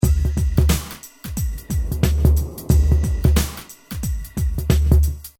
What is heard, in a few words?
Hip Hop Drum Loop 90 Bpm Swing; Drum Loops; Da Cryb Swing; Royalty Free Drum Loops